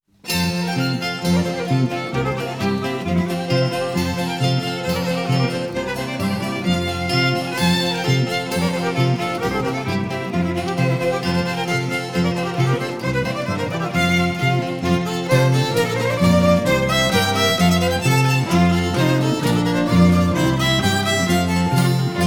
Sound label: Foreign Folk